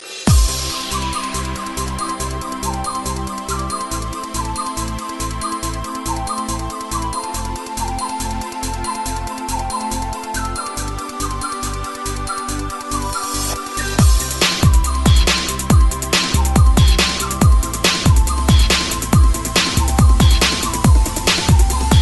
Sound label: Bass Beat